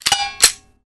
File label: overheat, Weapons